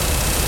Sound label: cryolator Shoot; weapon; Nuclear Tech